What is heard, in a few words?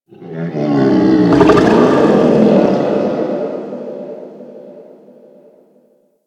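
Settlement Distant Animal Ambience; Warframe; Grineer Settlement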